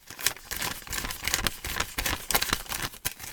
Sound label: Fast Banknotes Counting